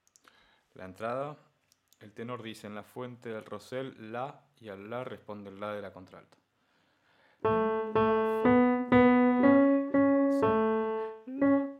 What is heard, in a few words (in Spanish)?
En la fuente contralto pasajes